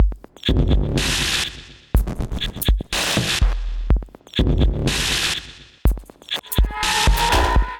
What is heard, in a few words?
Kit groove 123bpm, Glitch and Wash; MIDI Clips; 122 - 130 bpm; Raicho Kakumei Kit; Ableton; Factory Packs